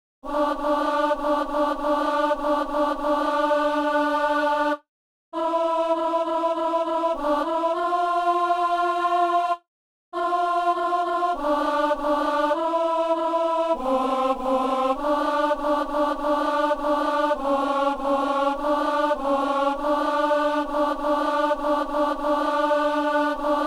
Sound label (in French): alto